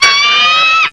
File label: eagle